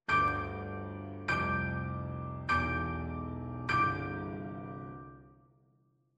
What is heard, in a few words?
debussy harmonies; Impressionism